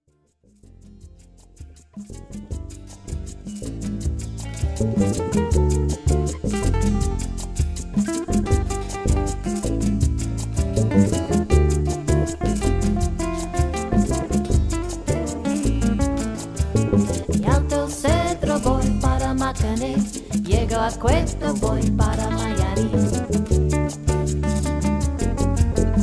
Jazz Fusion Band

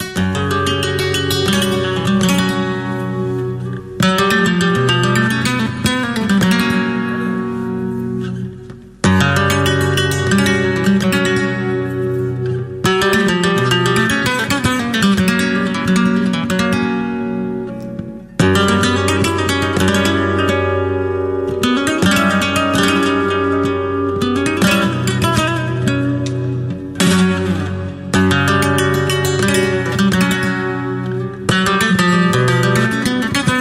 Flamenco Guitarist; Seville

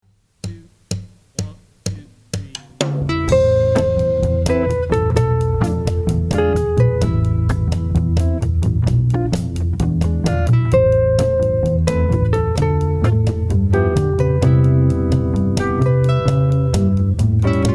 Jazz Band; Albuquerque

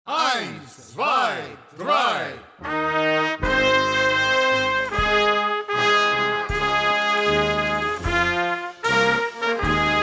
German Band; Chicago

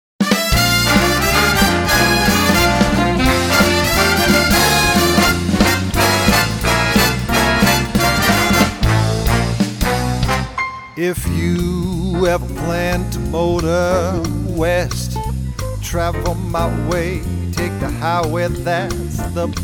Big Band; Los Angeles; young singer, performer and leader

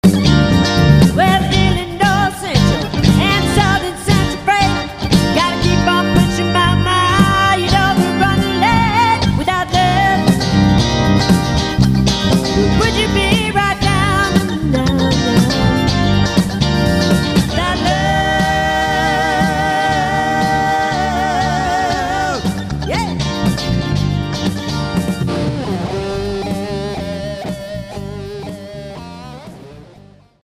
Classic Rock Band

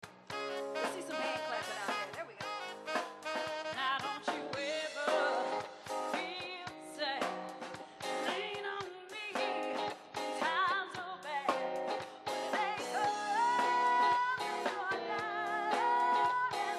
Variety Band; Atlanta

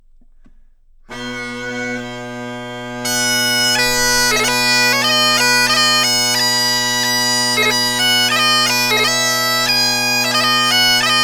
Bagpiper; Los Angeles Bagpiper

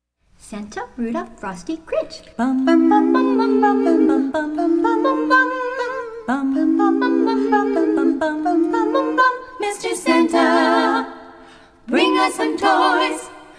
50s 60s Band

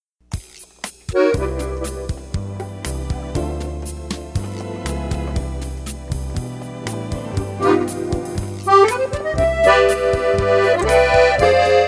Italian Band; Denver; This Denver Italian Band evokes the spirit of the old country with its diverse repertoire of tradi

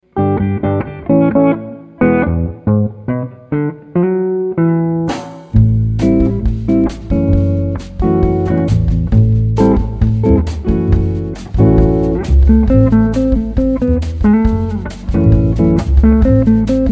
Jazz Band; Houston

